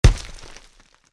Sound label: Bounce; mega boulder rampage gamerip